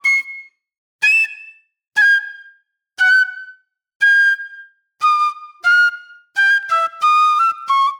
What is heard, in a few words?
Shrill Flute